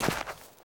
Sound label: sand, player footsteps